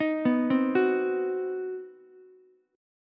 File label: C Minor Major 7th Flat 5th chord, Ukulele; arpeggiated; JGuitar